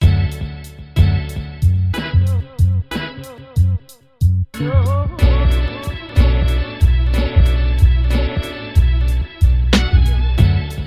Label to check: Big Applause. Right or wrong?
Wrong